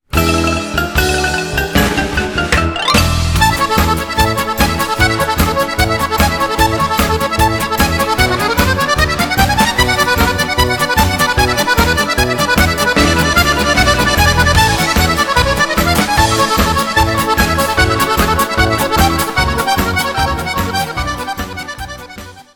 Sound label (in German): virituoses akkordeon